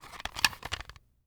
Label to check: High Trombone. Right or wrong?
Wrong